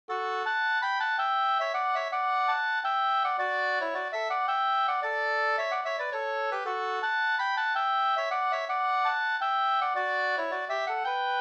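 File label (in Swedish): polska, tvåstämmig; Nas Nasragan Da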